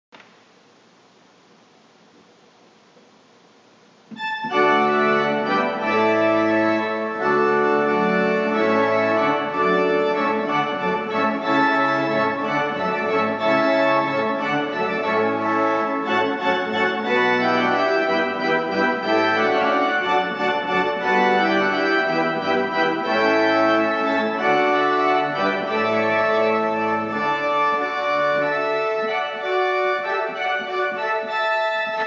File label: Hornpipe; Handel